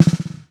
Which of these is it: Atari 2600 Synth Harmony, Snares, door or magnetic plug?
Snares